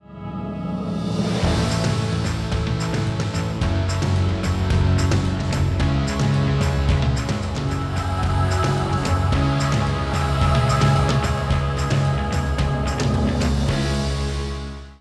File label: Music; The Trumpet Awards 2014